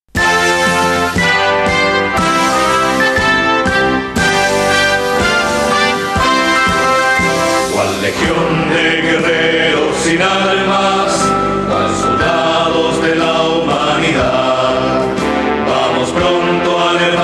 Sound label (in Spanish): Himno Radioaficionado